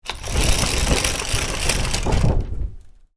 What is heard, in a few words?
Roller blind up, Roller Blind: Domestic, Roll Up With Cord; Miscellaneous Household